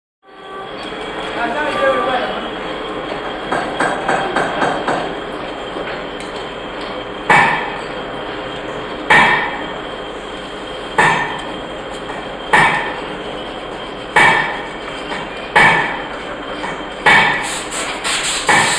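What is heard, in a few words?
Factory Workshop Atmosphere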